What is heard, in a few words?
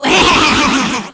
Bubble Spot; Zelda